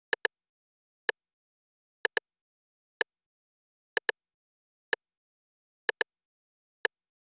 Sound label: Pepper Drum Loop - 125 BPM Click; Cymatics - ANALOG EVOLUTION Beta